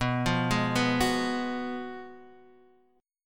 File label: Gb Major 7th Suspended 2nd Suspended 4th